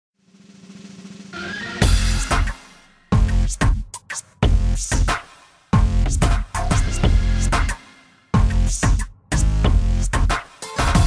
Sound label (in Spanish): mi familia es un grupo diverso instr